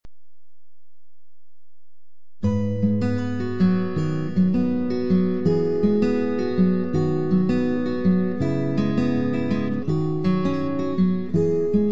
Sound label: laugther